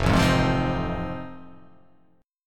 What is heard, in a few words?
F 7th Sharp 9th